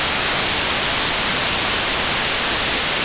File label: white Noise